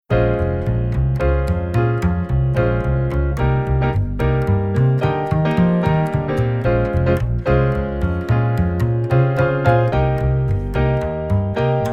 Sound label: Jazz Waltz Bass